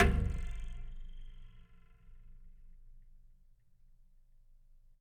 Flick

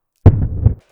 Loud thump

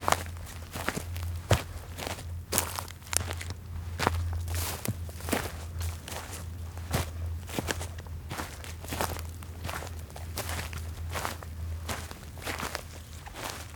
Gras Stones Woods Hiking

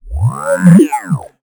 Robot

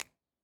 Snap

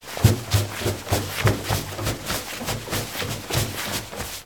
Walking Downstairs With Clothes Swishing

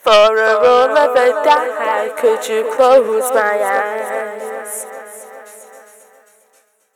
Vocal Hooks

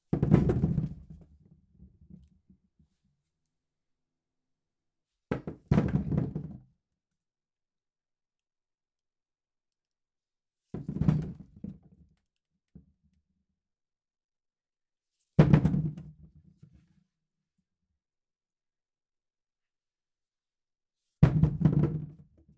Pouring Potatoes Onto A Table

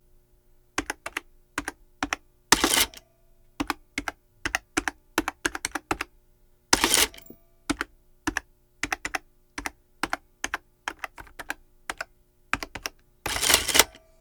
Cash Machine

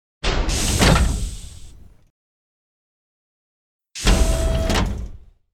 Scifi Corridor Door; Custom Starwars Inspired

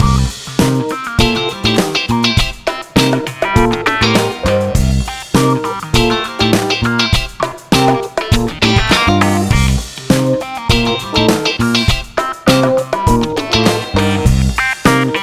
Flat Funk Loop

